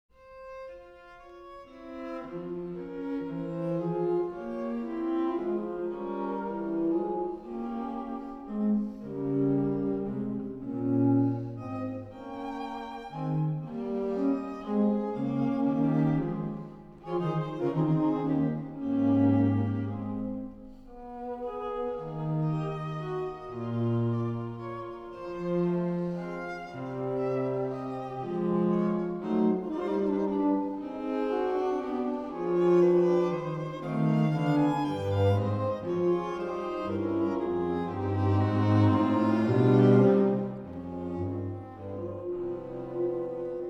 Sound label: String Ensembles, Andante; F Major KV 168; Mozart